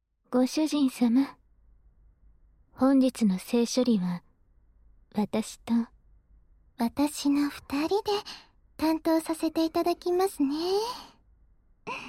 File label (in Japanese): 両耳舐め